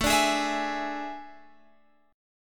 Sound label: A Major 7th Suspended 4th, Guitar, Open G